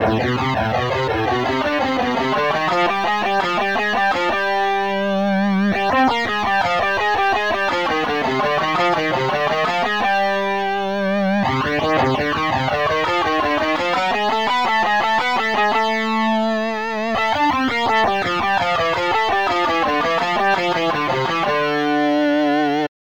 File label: Guitar